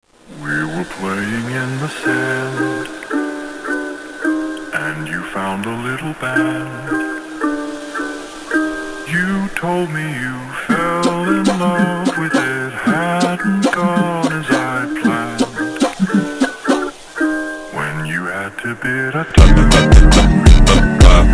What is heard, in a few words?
Funk super Slowed